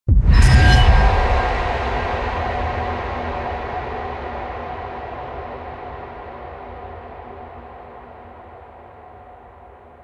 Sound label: Helmet Start Transition; Operator; Warframe; Sacrifice; Liset